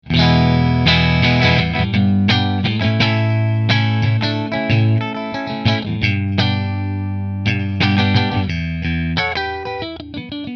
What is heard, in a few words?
Nola Voodoo Neck Middle Fender, guitars; New Orleans Guitars Voodoo Custom Quilt Top